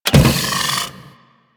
Extending Bridge Start; Warframe; Ambience; Corpus Gas City; Gameplay Remaster

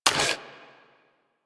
Pistol Mech; Warframe; Tenno; Priest; Weapons

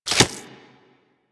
Nunchuck Equip, Weapons; Warframe; Infested

